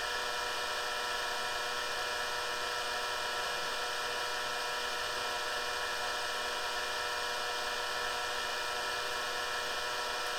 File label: msi side, noise; fx5800ultra2